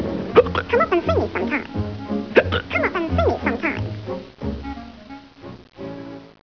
hic cup

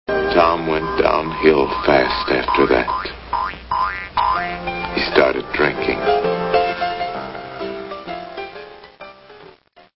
downhill; Tom en Jerry

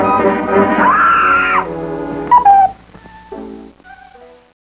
AHHH!

